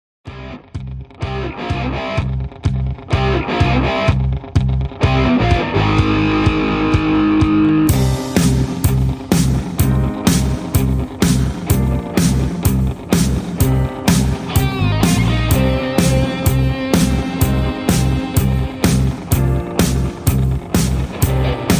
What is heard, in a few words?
instrumental No Leads